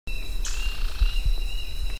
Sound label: Synallaxis ruficapillus alarm